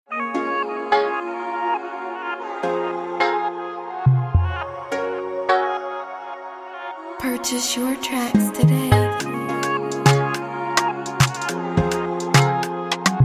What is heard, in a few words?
Afrobeat instrumental